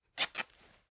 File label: sleeve rip